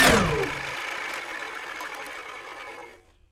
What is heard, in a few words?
gatling wind down; tf2